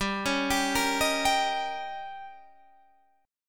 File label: Eb 7th